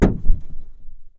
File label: roof body impacts; Blind Drive